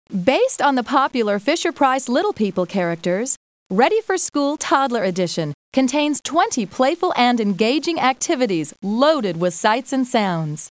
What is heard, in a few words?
Toddler